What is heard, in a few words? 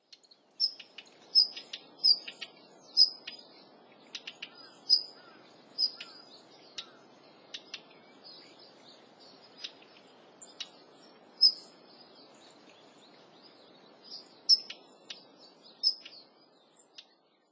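Daurian Redstart, Watarase; jobitaki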